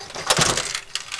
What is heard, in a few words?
money